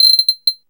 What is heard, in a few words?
guiro, synth guiros; Zero-G Ambient 1